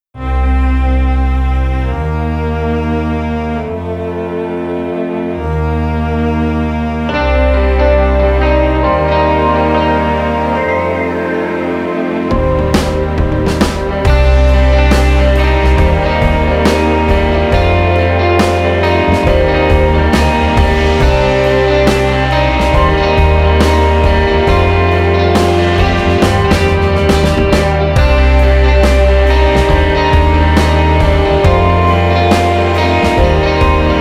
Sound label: Pop